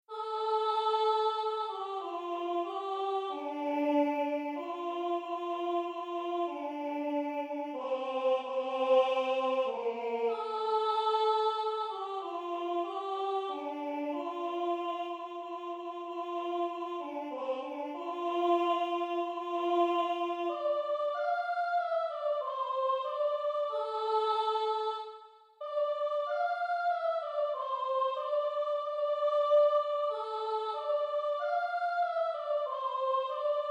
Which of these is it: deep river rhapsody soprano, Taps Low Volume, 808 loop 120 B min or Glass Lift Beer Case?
deep river rhapsody soprano